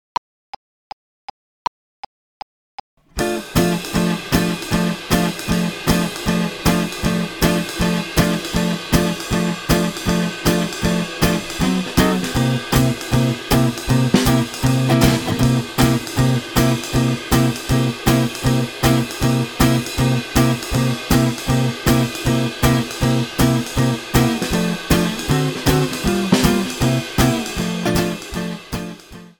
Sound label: swing; bill s parade